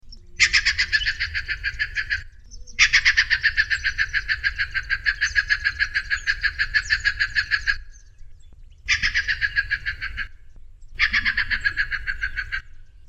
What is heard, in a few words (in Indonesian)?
suara pekaka emas